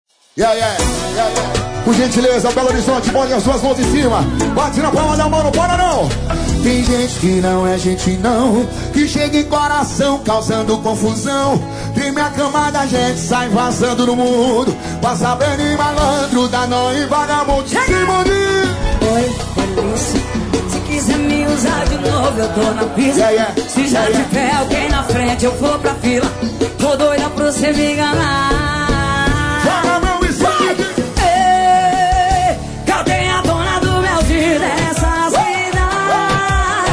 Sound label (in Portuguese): FORRO